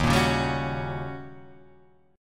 Eb 7th Sharp 9th, Guitar, Half Step Down, Eb7#9 chord; Eb7#9 strummed; JGuitar